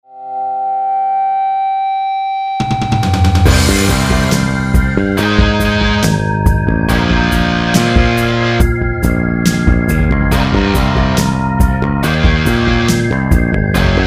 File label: metal